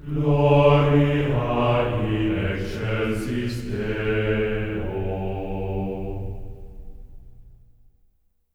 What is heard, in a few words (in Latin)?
gloria G3; Spectrasonics; GR GLORIA